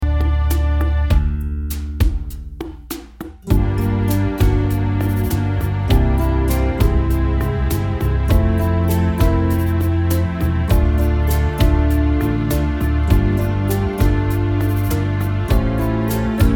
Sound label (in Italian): vers rumba